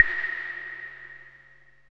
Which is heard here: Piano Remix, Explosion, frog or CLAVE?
CLAVE